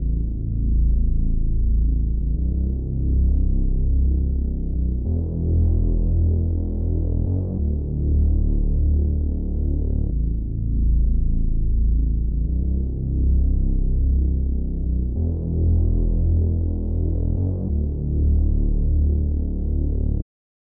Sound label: 95 BPM E Min Bass; Melody Loops; Cymatics; Hip Hop Sample Pack; Perro